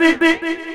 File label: Fx Vox; Equipped Music; Loungin House